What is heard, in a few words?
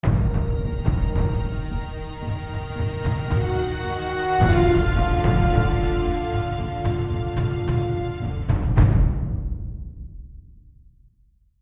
fight defeat; attacks